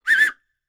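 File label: WHISTLE, ANDES; World Voices; ANDES FX; Spectrasonics Vocal Planet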